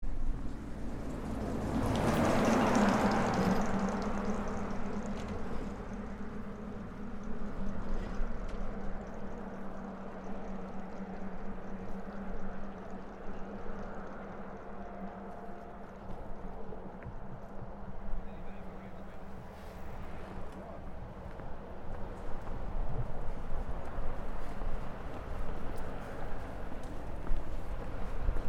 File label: Brighton beach UK